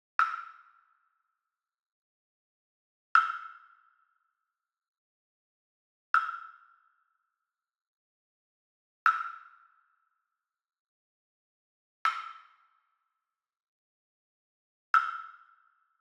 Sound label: Wood Percussion Wood Block Standard Hit Medium; boom library; Cinematic Strikes Construction Kit